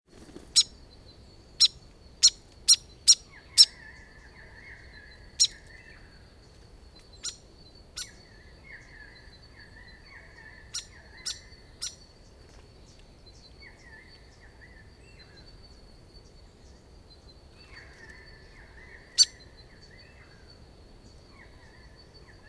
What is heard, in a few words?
Rhipidura Albiscapa; Grey Fantail; Family - Rhipiduridae (Fantails); Call